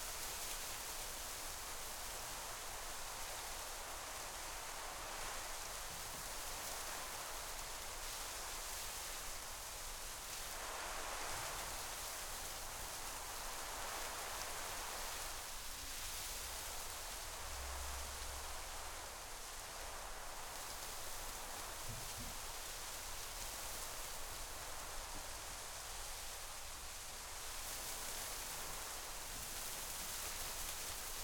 Env Tree Leaves Wind Light

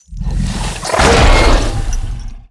Enemy Zmey attack

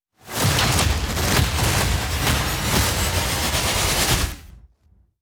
Ramp Up

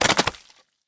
Impact Mud Drop Splatter Thick Liquid Slime Foley; Frogsong

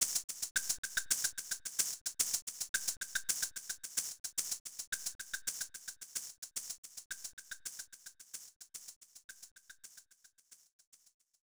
Dance - Calypso (Solo); Spore Creatures; Nintendo DS

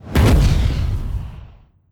Fire; Shaman Fire Imp2

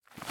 Footstep Dirt Rev

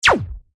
Laser; Go, Kizi, Go!

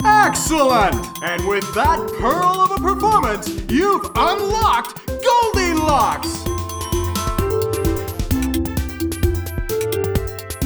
Glocks; Shrek Smash n' Crash Racing; PS2

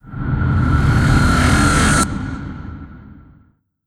Dark Magus Impair Wind

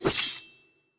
Goblin Blade Slice

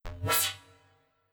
Sceptre; Godess

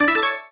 Baseball; Sfx Baseball

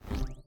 Timer Restart; Viewfinder

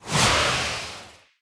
Bee Soldier Drill Charge; gamerip

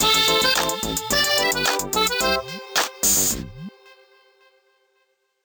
Transition

